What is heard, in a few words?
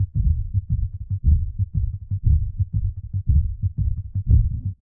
deep bass rumble heartbea